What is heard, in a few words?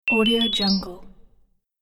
sine tone delay